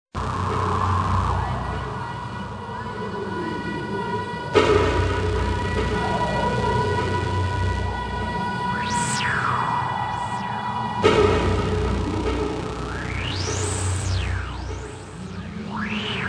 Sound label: tribal symphony